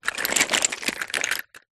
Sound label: household makeup many rummage